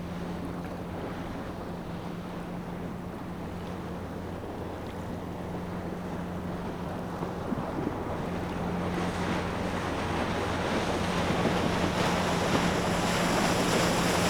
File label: EXT Fish Boat by Near; Poetry Project